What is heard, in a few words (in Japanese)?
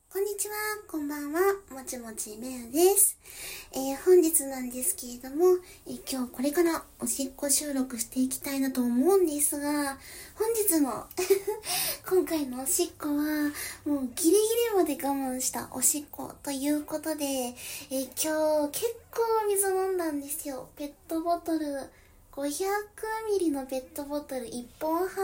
ギリギリまで我慢しておしっこ